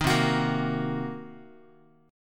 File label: C Minor 7th Flat 5th C down Guitar; strummed; 4A,4E,5A,5D,5G#,6C#; JGuitar